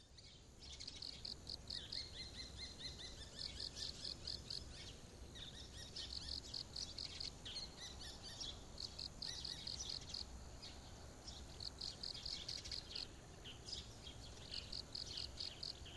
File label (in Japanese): suzume